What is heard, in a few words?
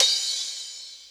HH, HIHAT HIP, Dance Mega Drums; Best Service